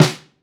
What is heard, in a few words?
Thud